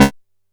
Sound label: C#3, Bass; E-MU Producer Series Vol. 6 – Analogia Project; Boom da Bass